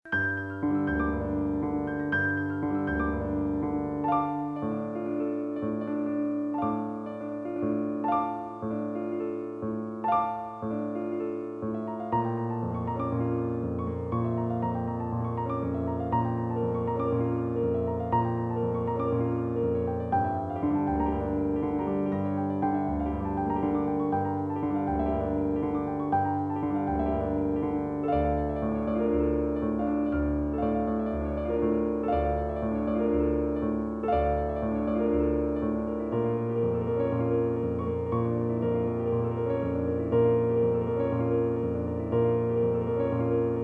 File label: Piano